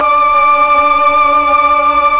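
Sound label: Pad Tron Violin & Choir D6; Tron Str+Vox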